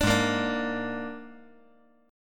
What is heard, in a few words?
G sharp Suspended 2nd Flat 5th G sharp down, chord; G#sus2b5; strummed; JGuitar